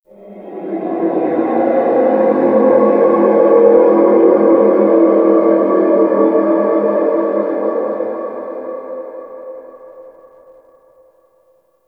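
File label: Shipyards Distant Moan, Ambience; Warframe; Grineer Shipyards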